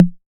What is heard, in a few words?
808 SD Tape, Snare Drum; Goldbaby Tape808 & Tape909